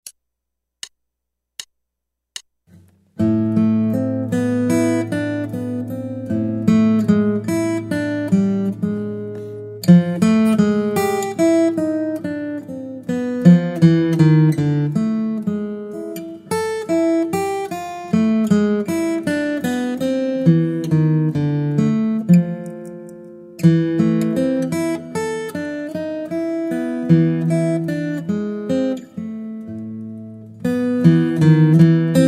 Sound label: Guitar